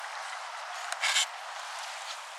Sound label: Brown booby; پرنده بوبی قهوهای